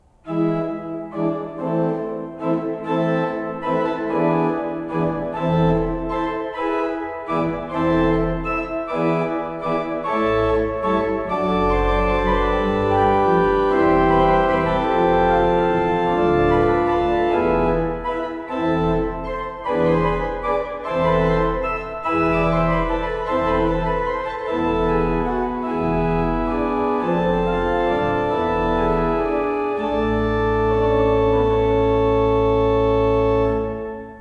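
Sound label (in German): Van Vulpen 12 II P, pfeifenorgeln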